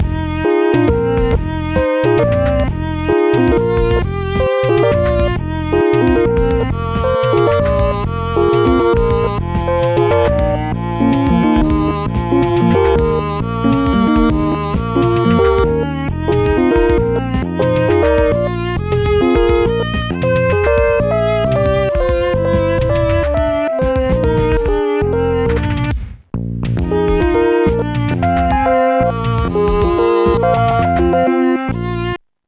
mellow in minor; Instant Music

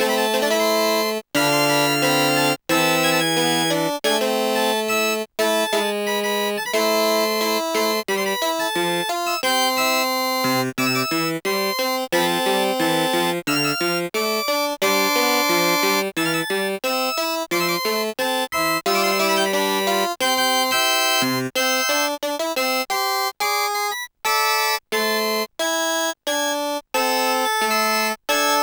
gavotte ORG; Deluxe Music Construction Set

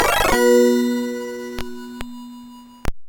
jingles; superscramble

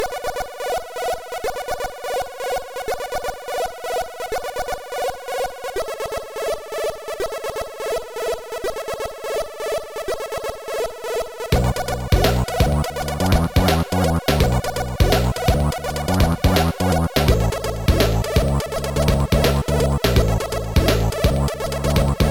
hip hop funk, chiptune

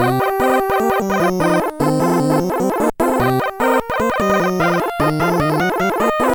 funky chip; Jaguar XJ220

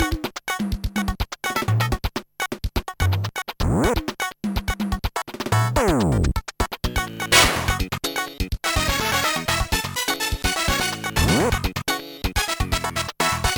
funky guitarist; Trackers; Modland

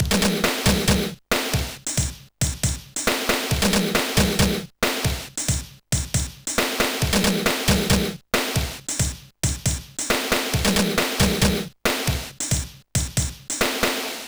Dynamic Drums Pattern

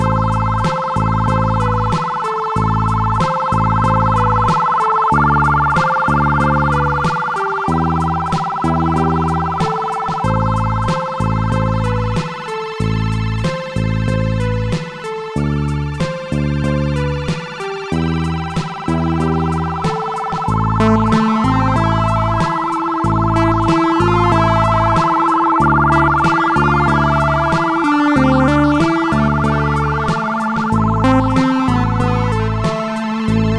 digital groove; MusicLine

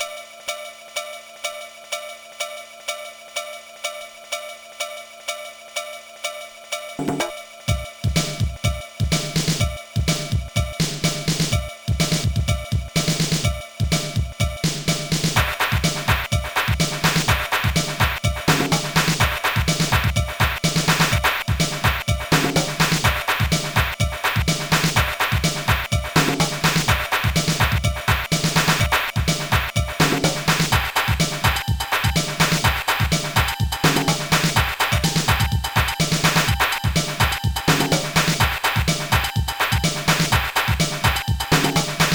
mod basic jam rythm; crionics

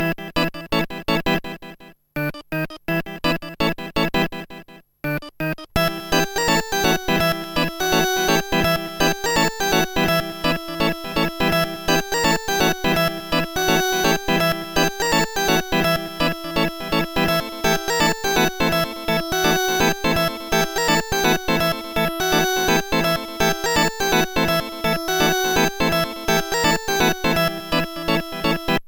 orgel-blues; Trackers; Modland

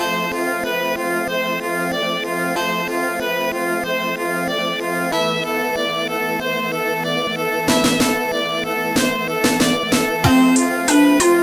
sad ballad

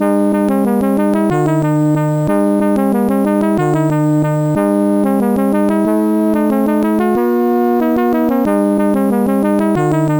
phrygian; MusicLine

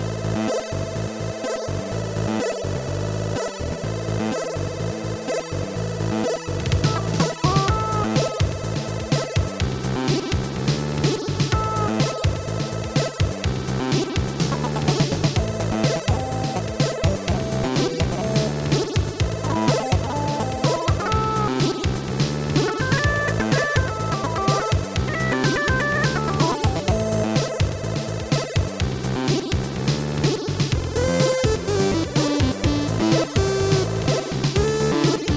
mod beat

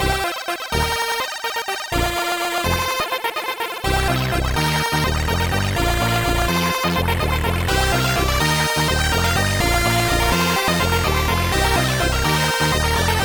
don't need drums; Trackers; Modland

